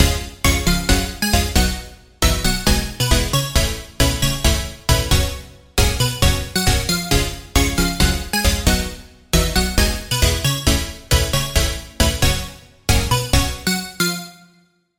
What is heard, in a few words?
Pluck; Old Tune; Routed Sound; Vital Expansion - Hyper Gamer Vol.1